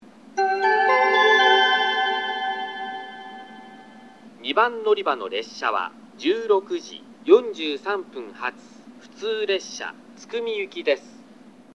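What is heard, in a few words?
fireworks; tukumi hanabi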